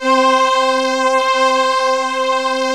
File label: VP330